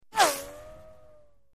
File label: Right To Left Tonal, bullet bys